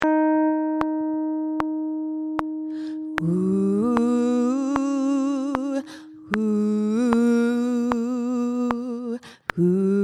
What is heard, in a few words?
gospel silent night tenor